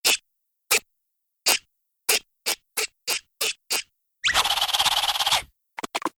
heal dance

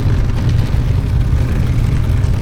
jury moves; ToontownStride

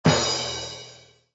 cymbal; Toontown Online

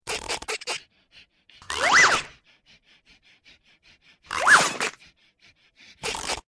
rollover; toontown-classic

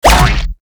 drop boat cog; Toontown Online

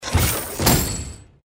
diving treasure drop off

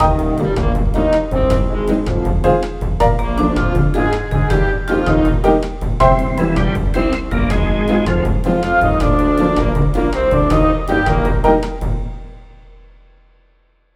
elevator

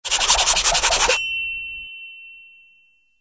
rub out; Poodletooth-iLand

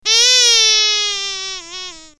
clock

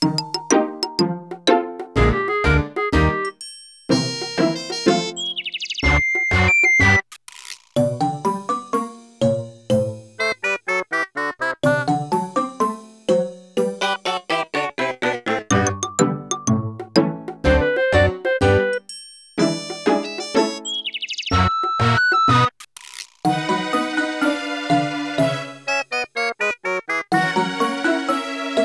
minigame race

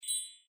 pairing bonus moves

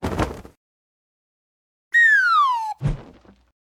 sacked, sfx; toontown-ceiling

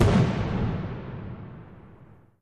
firework distance

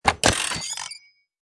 telephone hang up

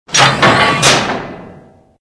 door open

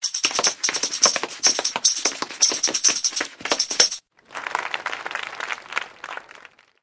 happy dance; toontown classic

